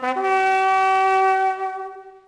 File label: Trumpet; Rise of Nations